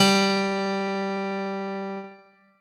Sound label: keyboard g high